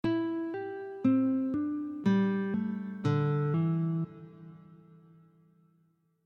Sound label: hammer, guitare